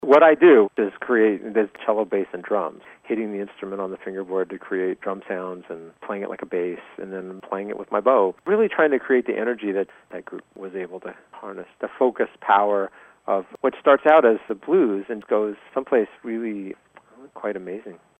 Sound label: cello